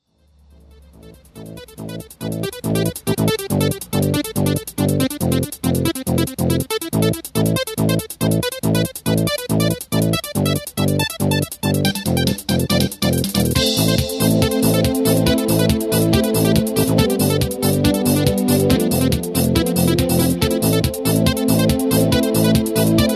sony ericsson t300 pure trancer

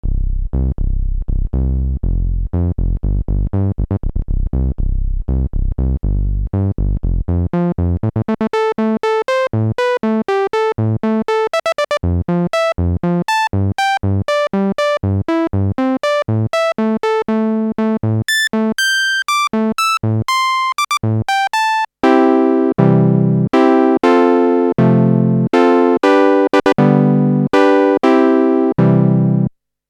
clavia nord modular kb filter lpf12 q000

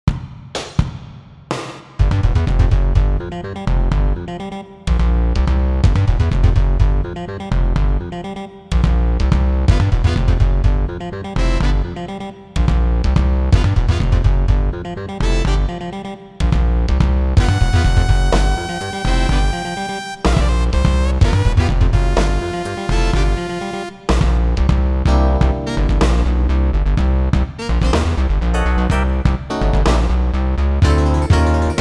yamaha v50; factory demo

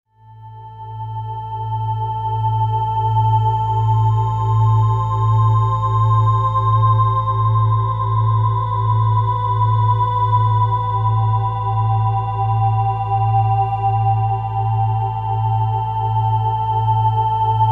kawai k3 pure ambient

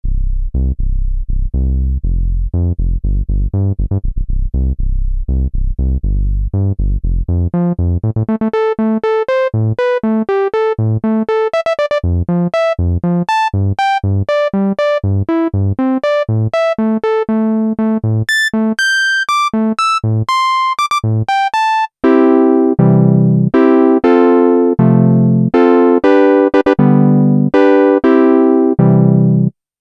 Ensoniq Fizmo filter, lp2 lp2

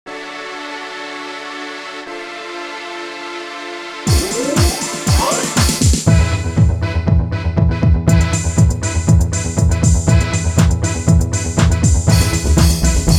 synth textures; Technics SX-WSA1R; Acoustic Modeling

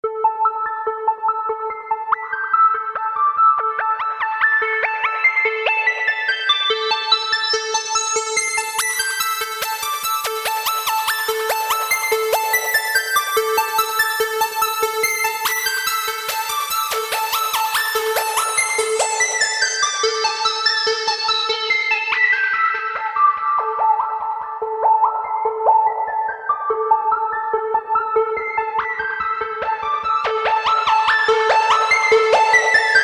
e-phonic invader vsti sounds external fx

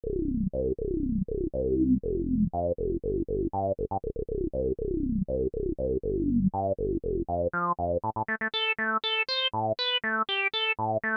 kawai k5000s filter lpf q075

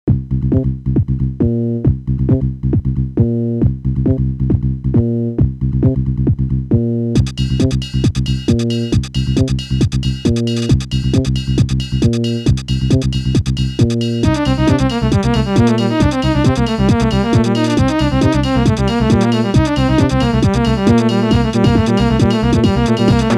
bontempi gt 630; factory demo

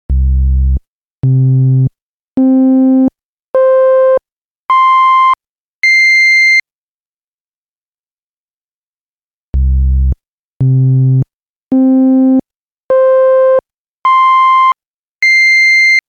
hohner hs 2 e samples 8 waveforms

